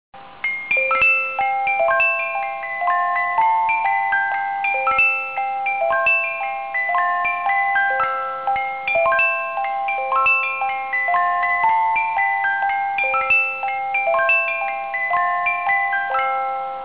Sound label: Turkish March